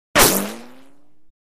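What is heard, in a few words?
bullet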